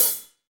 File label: HH, Real Kit; Real-Kit s